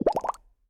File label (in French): bulle; transformice